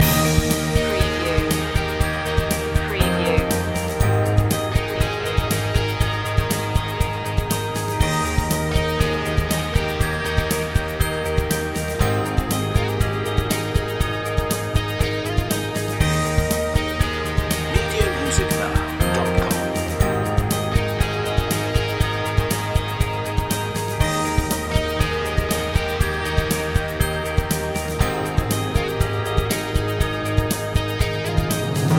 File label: loop